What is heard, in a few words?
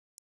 3 tones